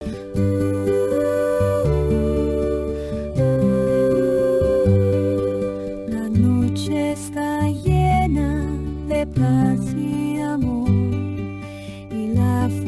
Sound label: music